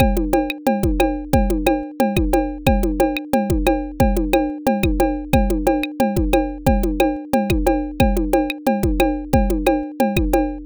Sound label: salsa pattern